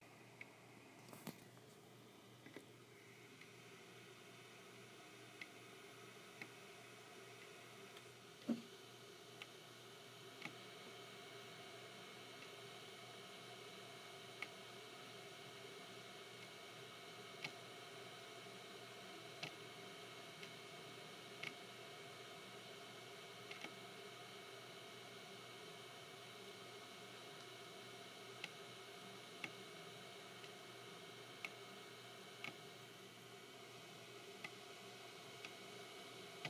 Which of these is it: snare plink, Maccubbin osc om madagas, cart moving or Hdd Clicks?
Hdd Clicks